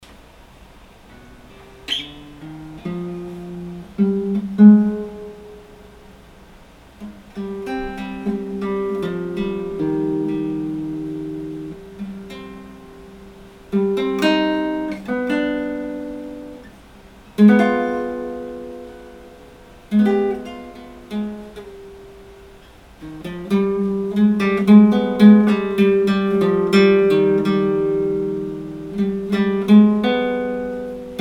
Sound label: guitar theme